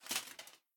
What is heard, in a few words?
armor heavy; Smite; god Lancelot